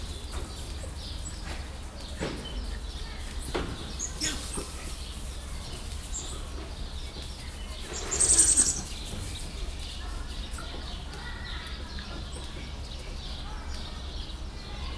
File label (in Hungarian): étkezés közben verekednek, ormányos medve